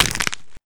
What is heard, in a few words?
dislocation; casualtiesunknown